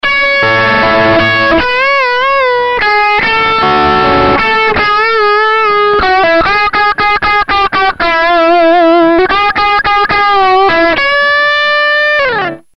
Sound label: Vox AC-15